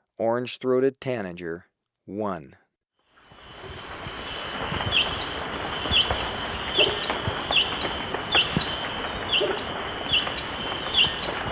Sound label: Orange-Throated Tanager, Birds of Ecuador; S. America